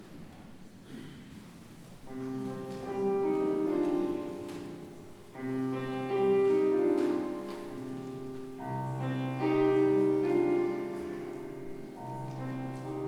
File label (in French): chorale